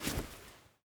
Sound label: hatchet deploy; Rust